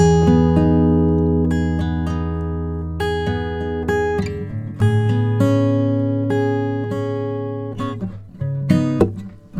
Xmass Guitar Loops 100bpm Cmaj; Guitars; Ghosthack; Advent Calendar 2019

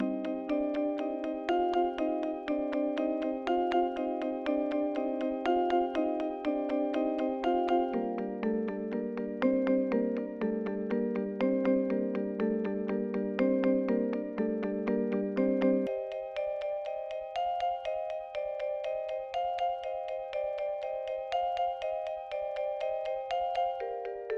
121 BPM G Min Main Bells; Loop Stems; Cymatics; 2022 Melody Collection Platinum Expansion - Pt 1; Hibachi

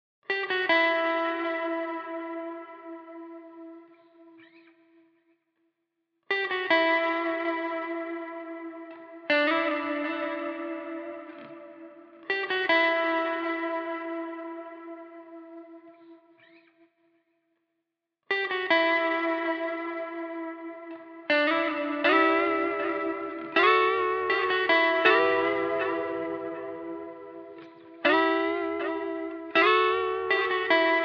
80 BPM E Min Lead Guitar; Loop Stems; Cymatics; Abandoned

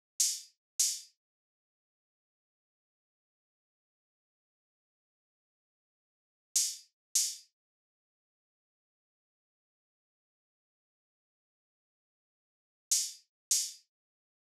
Jungle Drum Loop - 151 BPM Open Hat; Loop Stems; Cymatics

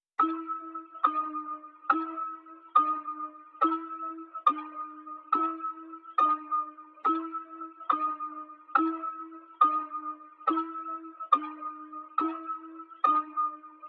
140 BPM C# Min Bell; Melody; Loop Stems; Cymatics; 2022 Melody Collection Platinum Expansion - Pt 1; Madness

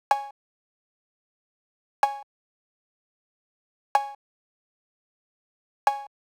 Drum Loop - 125 BPM Cowbell; Pepper; Cymatics - ANALOG EVOLUTION Beta

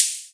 Machine Open Hihat; House; Drum One Shots; Hihats - Open

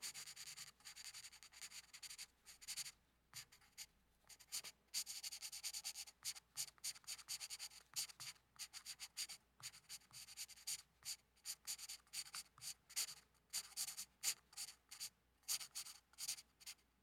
Drawing Pencil On Paper; Fairlight Sound Lib

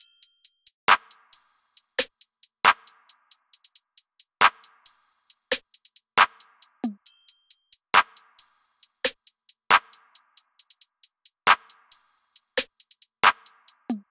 Drum Loop 136 BPM; Cymatics; Mystery Pack Vol 4

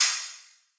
Riddim Ride; Hats & Cymbals

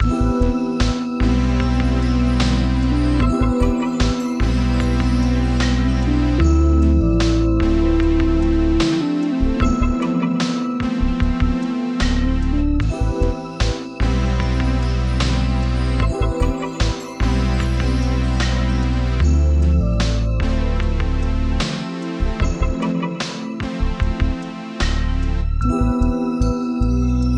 75 BPM A Min, Melody Loops, Retro Collection; Nationals

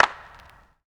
Old Clap; Lofi; Cymatics; Lofi Starter Pack

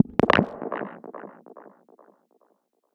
Squelch E 142 BPM, Psytrance; Ghosthack; Psytrance Visions Volume 2

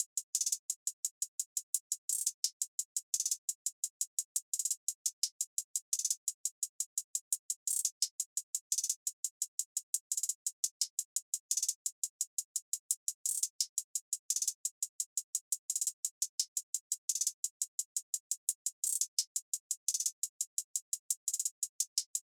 Drum Loop - 86 BPM Hihat; Loop Stems; Cymatics; DOPE DRUMS VOL. 1; Sky

